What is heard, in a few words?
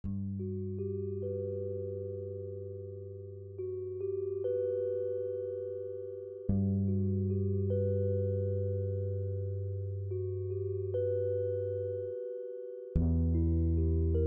ambient